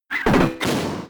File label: High Jump Kick